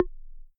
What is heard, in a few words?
menu click; leviathan